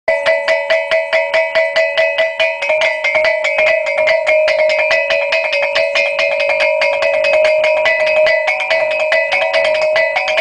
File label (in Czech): Tradicni hudba Filipin Kulintang